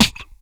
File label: VOC DRUM, MOUTH PERC; Best Service Hyper Dance